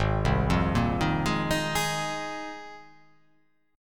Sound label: G sharp 6th Add 9th G sharp